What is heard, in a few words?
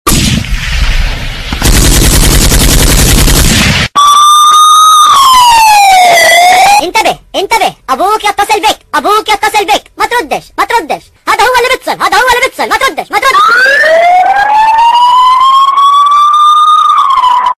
best comedy ringtone